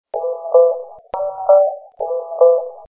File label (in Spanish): arco musical; reciclar tocar